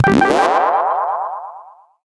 repulsion bonus

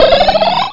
alien fly; insectoids2

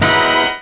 orch

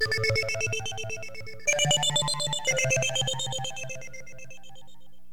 SQUIGGL, HipHop Keyboards

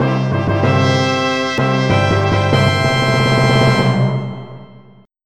victory; PC Gamer IT CD 31; REALM

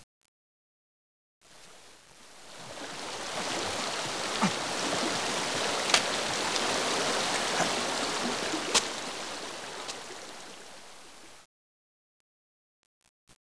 tide

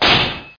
Sword; Lords2

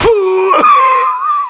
die; outlaws

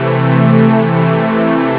low, synth chords

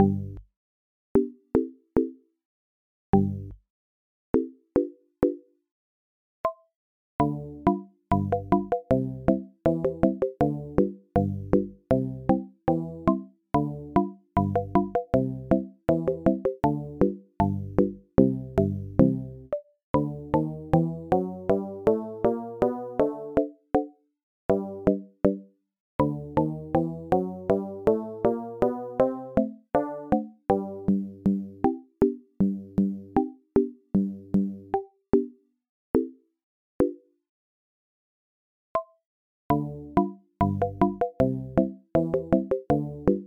pizpolka, polka

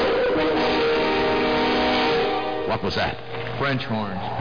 F HORN

